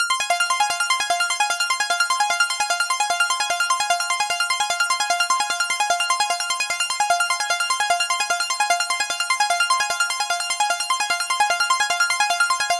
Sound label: Arp Loop 150 BPM F min, Dubstep; Arp Loops; Synths; Raptor; Cymatics; Dubstep Starter Pack